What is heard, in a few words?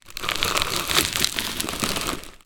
plastic object roll scrape over gritty surface; foley; zapsplat